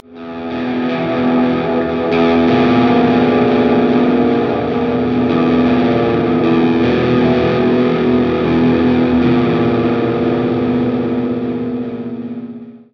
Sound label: Forest Grunge; Torchlight III; PS4